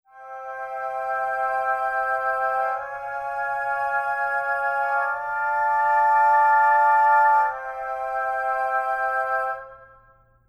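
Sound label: maj min triads legato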